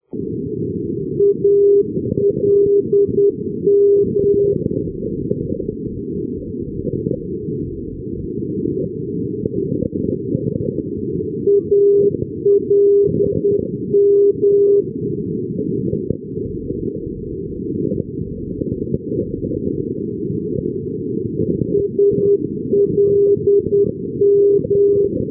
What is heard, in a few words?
ndb aix en provence les milles; europe